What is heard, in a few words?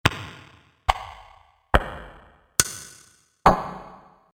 5 Clicks Short With Heavy Reverb